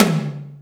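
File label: Tom Hi Cannon; Alesis D4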